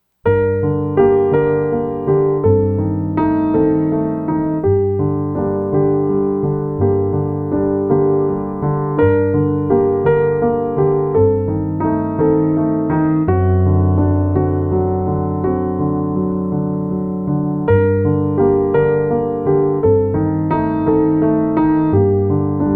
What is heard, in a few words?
piano